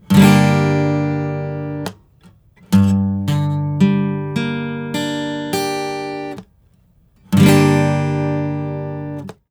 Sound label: Major or Minor